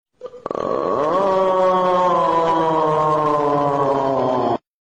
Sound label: aaaahhhhmmmm